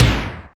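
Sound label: Vintage Snare, Roland BK-5; Snares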